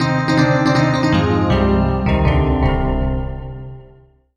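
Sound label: MUSIC EFFECT Solo Electric Piano Negative; startide macos switch windows gamerip 2017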